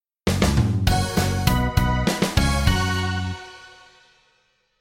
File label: station break